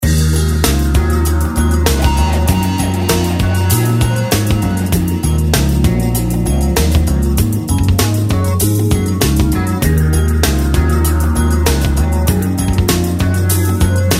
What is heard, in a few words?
STRANGE LIQUID GUITAR